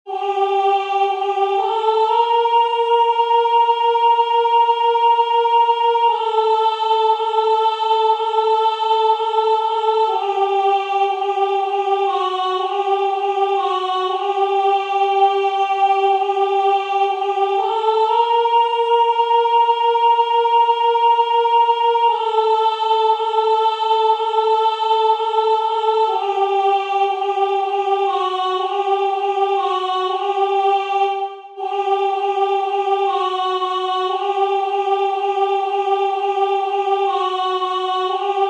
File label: Soprano; ECCE QUAM SIT